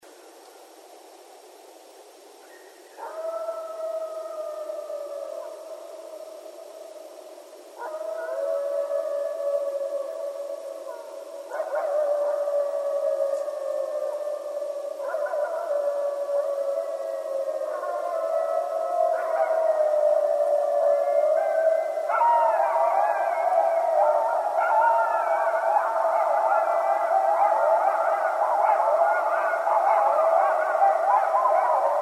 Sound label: Coyotes; Coyotes (Canis latrans)